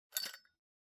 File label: Lighter Noise